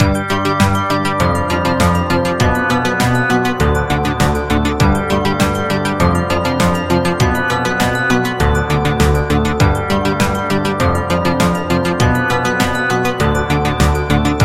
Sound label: Bell Ringtone Beat